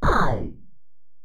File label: EPerc, Alesis DM-PRO